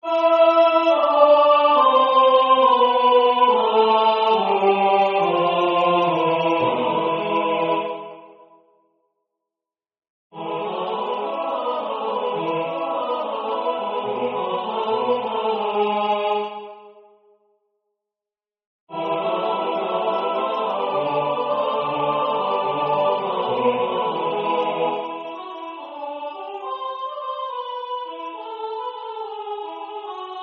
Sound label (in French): TuttiChoeur; Los Pastores